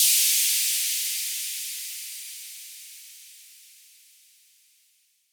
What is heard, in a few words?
Cym, Waldorf Blofeld